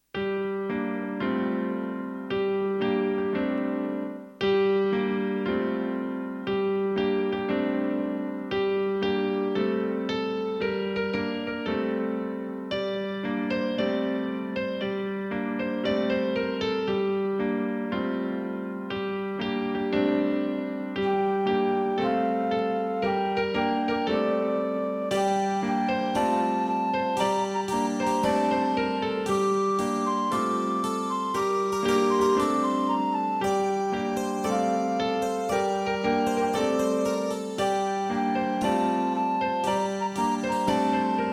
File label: music only; childrens songbook; french